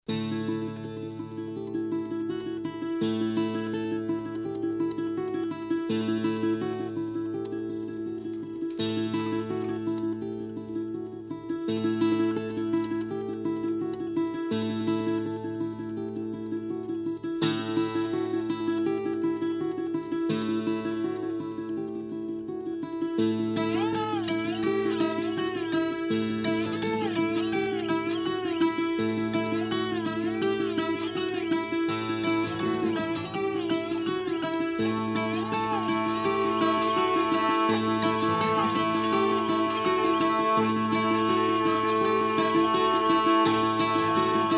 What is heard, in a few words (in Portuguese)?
TOADA E DOBRADO DE CAVALHADA